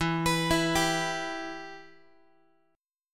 E-Minor, Guitar; arpeggiated; JGuitar

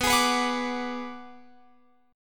B Minor 6th B, Guitar Open F; Bm6 strummed

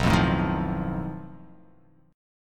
B 7th Flat 5th B, chord

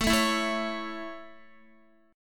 A Major, Guitar; strummed; JGuitar

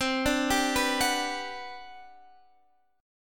G Major 7th, C; Gmaj7/C; arpeggiated

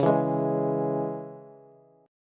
C sharp Minor C sharp Bass, chord; strummed; JGuitar

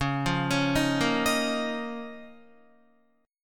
C sharp 6th Add 9th C sharp Guitar; arpeggiated; 9,8,11,8,10,10

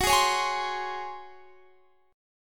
Db Major 7th Suspended 4th Gb

